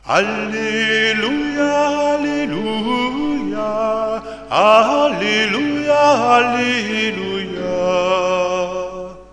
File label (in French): Alleluia T, chorale